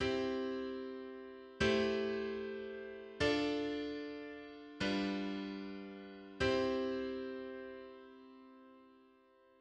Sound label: I-vi-ii-V turnaround in C